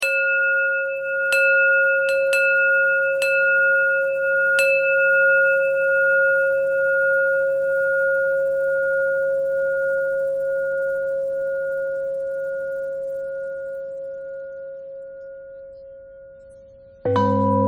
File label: bell thread pentatonic